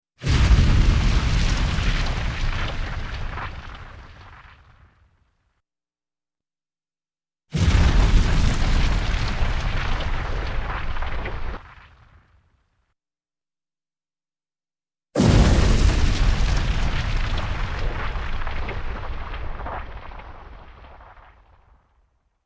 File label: Explosive Fire Burst, More Fire, Good For Catapults, Fireballs, 3x